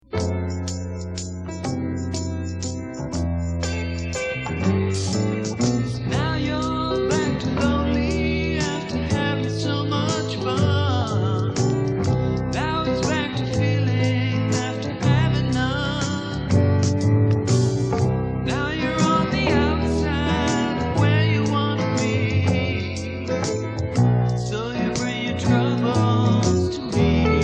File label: waltz song